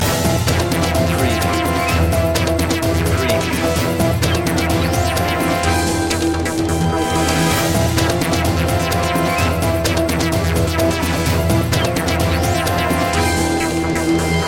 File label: digital voodoo loop